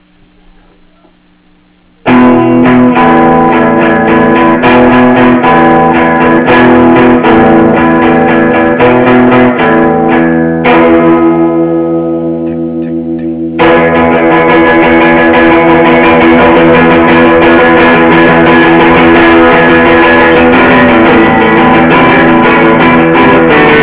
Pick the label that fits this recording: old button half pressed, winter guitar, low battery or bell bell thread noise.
winter guitar